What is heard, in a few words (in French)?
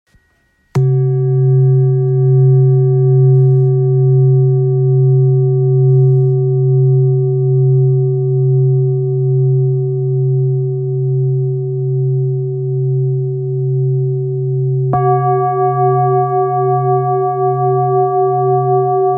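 Bol therapeute la diese, bol chantant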